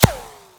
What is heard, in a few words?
dirt, hit; tacz-tweak-pack; taczmechanics